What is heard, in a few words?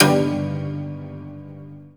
G4 harp; Northstar; The Wizard VOL-1; BLACK HAMMER